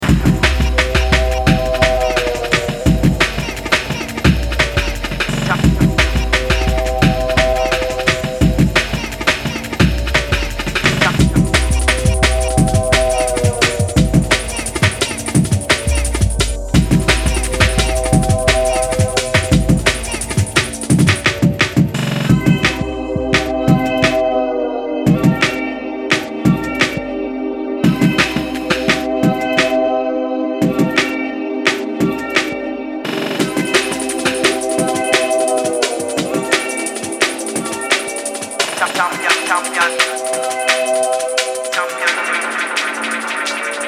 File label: Dred Bass